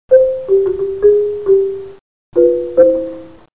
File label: dunt dunt; How Many Bugs in a Box